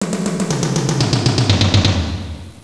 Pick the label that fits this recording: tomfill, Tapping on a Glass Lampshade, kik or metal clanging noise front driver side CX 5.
tomfill